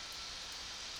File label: Background Noise